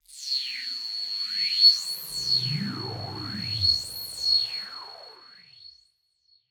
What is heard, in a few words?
sound design sweep high pitched into low sci fi